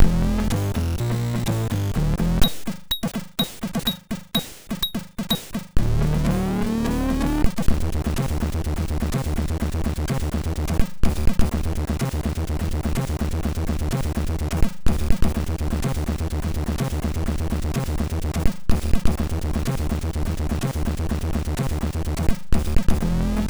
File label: Geister, MOS6581R3